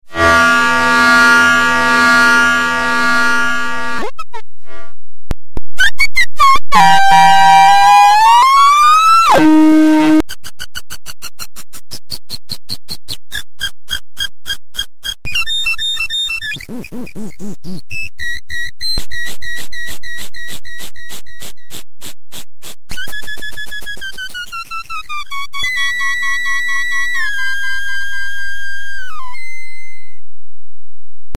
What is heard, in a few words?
flute standard, live Flute Synthesis